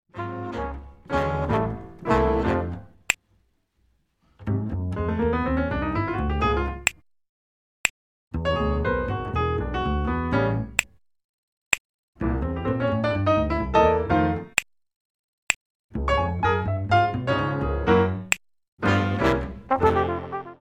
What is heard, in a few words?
minus drums